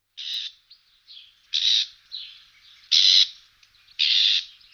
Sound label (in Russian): kamyshovka trostnikovaia